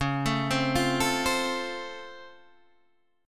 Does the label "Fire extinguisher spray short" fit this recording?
No